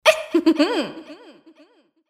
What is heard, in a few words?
moan; Imperial Girls